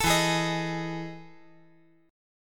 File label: E Major 11th down